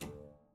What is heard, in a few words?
Harpsi Lute Rel D1, Harpsichord, English; Zuckermann Kit Harpsi; Chordophones; Releases